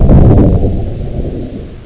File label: thunder, ambience; svencoop; blackops